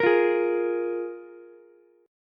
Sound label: F Minor Double Flat 5th, F, Ukulele; Fmbb5 strummed; 15,17,16,x